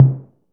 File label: tom mid, drums; Playdate SDK; MIDI Player